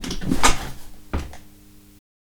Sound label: Sit On Chair